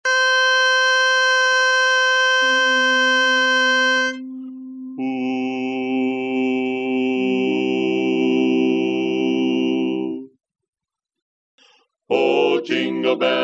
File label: bass; Christmas